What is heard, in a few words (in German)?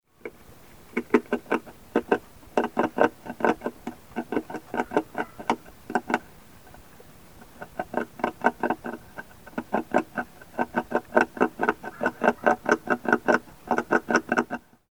Biber Nagen